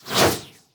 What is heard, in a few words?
begin turn; squares